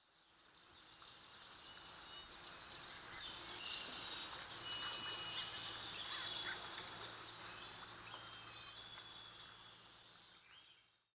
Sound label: birds